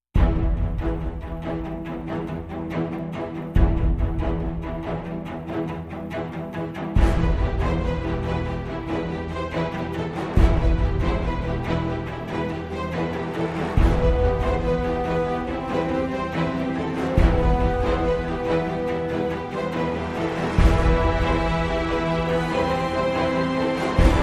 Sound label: No Perc No Choir